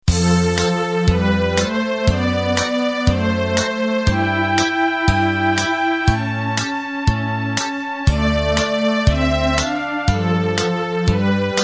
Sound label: Broadway Intro